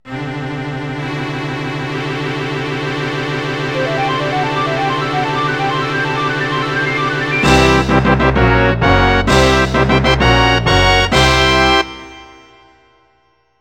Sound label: Boss Unlock; donkey kong 64